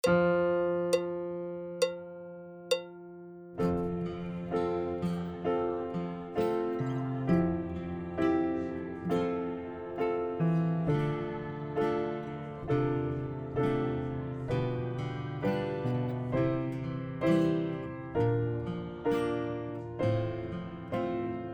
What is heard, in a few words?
Backing; Section D